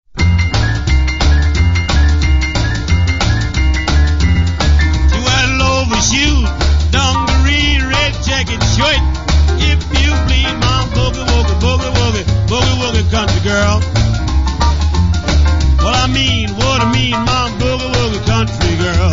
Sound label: boogie woogie